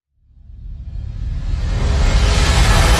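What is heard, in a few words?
Reverse Heavy Rumble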